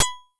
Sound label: broke pick; dos lem